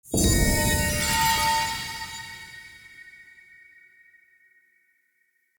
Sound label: Light Mirror Beam Hit Positive Target; Puzzles